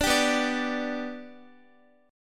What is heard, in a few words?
B-Minor Sharp 5th, Guitar; strummed